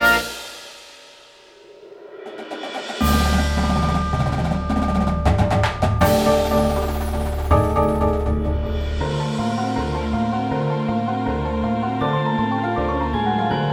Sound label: Transition Only Mockup